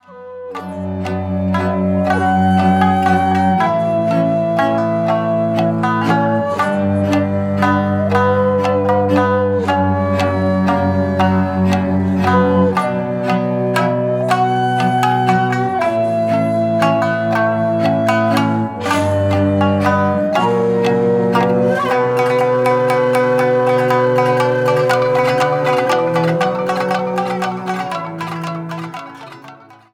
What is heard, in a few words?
resonance sample